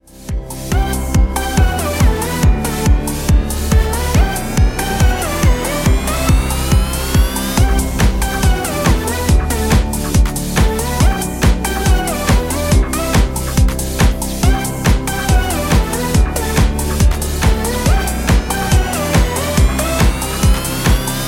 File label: Motion electronic dance music, ringtone; MIUI12